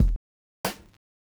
Kick-Snare; Multitrack

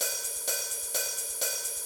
hat loop

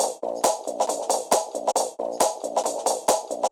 Intro Drums

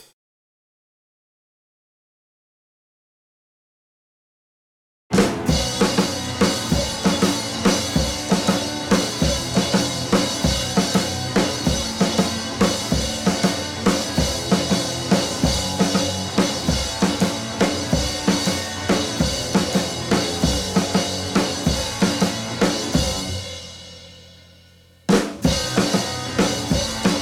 drums

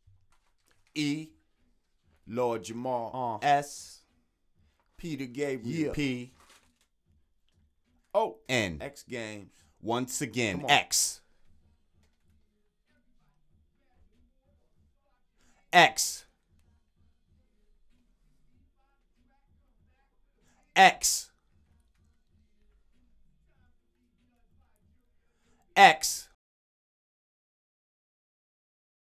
Lead; Summer X Games

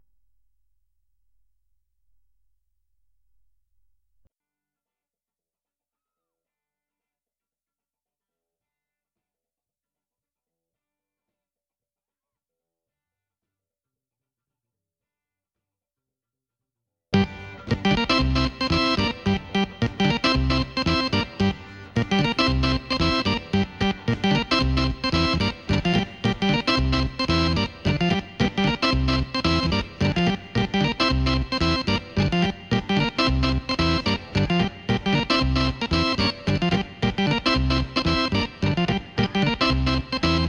Keys

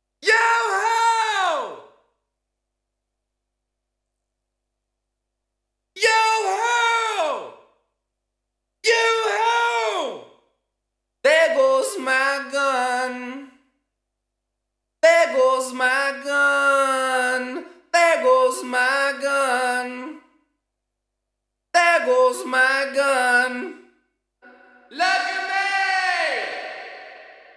vocals

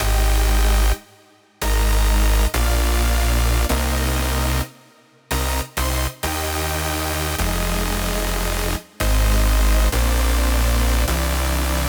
Main Synths + Bass

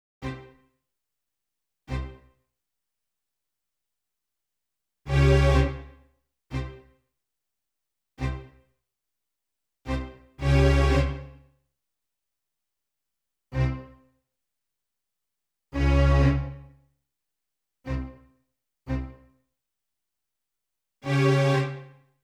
Strings

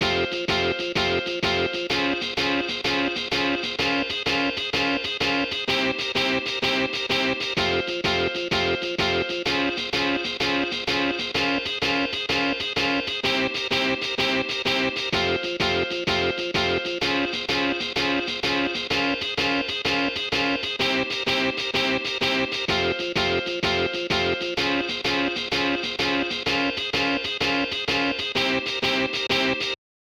Git Riff

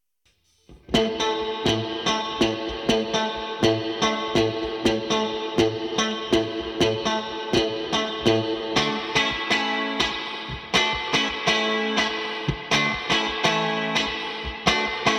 guitar

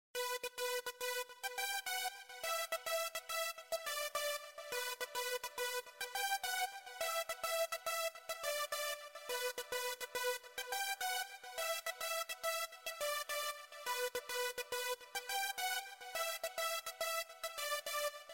Synth Chorus; Remix Sample Pack

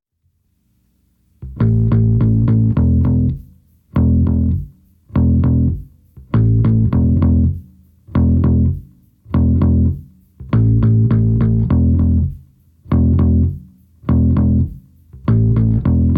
rhythm

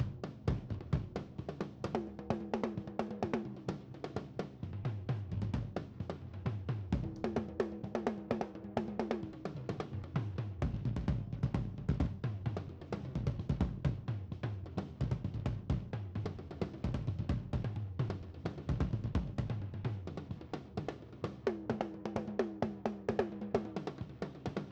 Drums Toms Coda

